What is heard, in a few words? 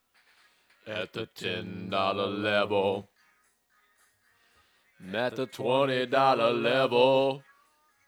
vocals background